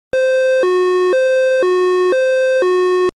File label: Station Alert Tone